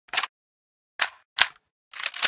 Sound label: reload, weapons